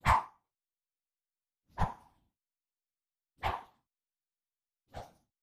stick synth

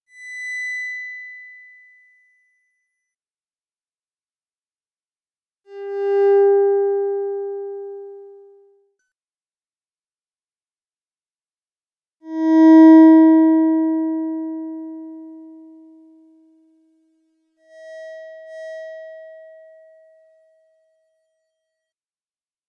Bowed Strings Example

